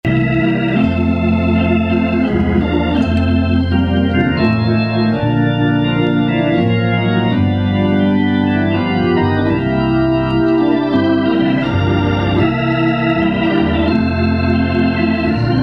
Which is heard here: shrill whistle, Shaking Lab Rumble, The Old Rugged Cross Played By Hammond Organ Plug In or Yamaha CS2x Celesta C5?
The Old Rugged Cross Played By Hammond Organ Plug In